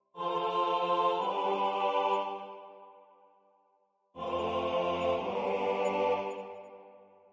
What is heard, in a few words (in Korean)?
주3화음 전체